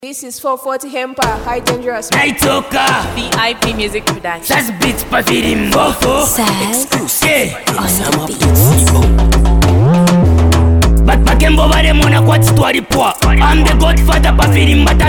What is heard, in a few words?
Freestyle